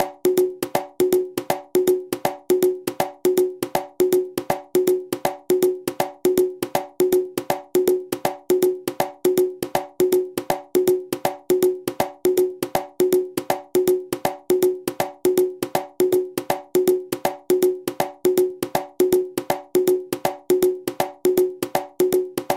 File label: columbia habana repicador